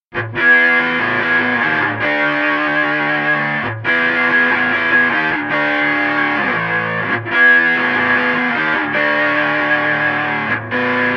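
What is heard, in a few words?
A 140 guitar rocky riff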